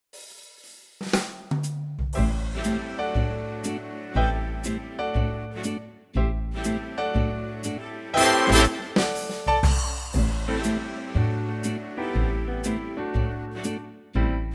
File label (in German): vollinstrumentiertes Playback ClassicBigBand a-moll